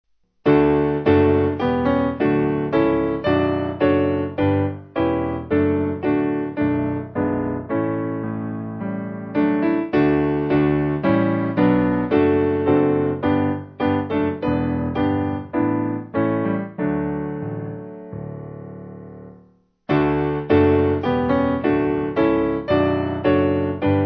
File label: piano, Free Music Backing Files for Churches; Simple Piano